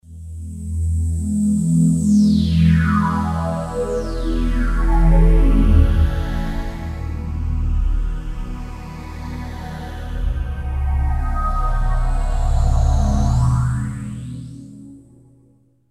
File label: pad sweep, Roland JV2080